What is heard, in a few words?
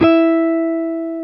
Jazz Guitar; 90's Sample CDs; Club-50 - Foundations Roland; Jaz-Emperor